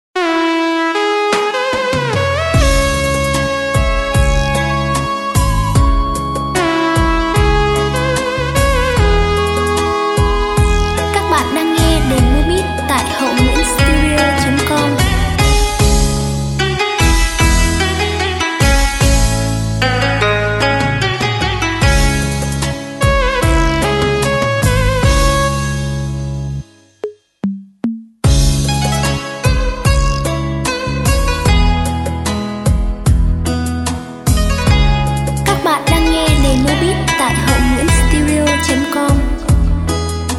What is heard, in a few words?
beat, playback